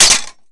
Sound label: Hit Metal Claws Slash Armour